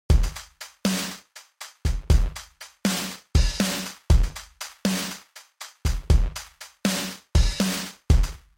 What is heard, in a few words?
drums; butch vig